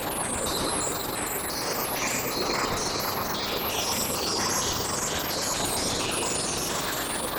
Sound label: Moving Noise 130, Tempo Loops 130bpm; stereo toolkit samples; Moving Noise C